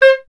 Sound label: clarinet C; Miroslav Vitous; Solo Instruments VOL-1